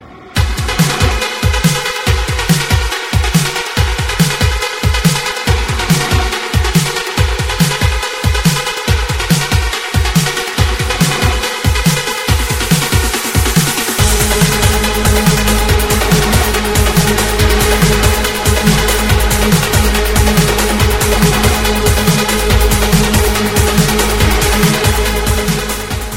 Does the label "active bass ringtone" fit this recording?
Yes